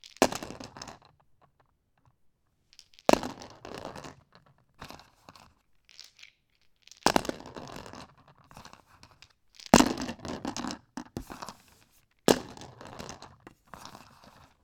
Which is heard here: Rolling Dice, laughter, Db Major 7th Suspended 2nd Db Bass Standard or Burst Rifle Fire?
Rolling Dice